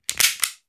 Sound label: Gun Empty Fire